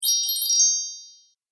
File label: Fairy Chime Spell, magic; Magic Sound Effects